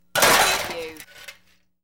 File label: Metal Medium Impact Settle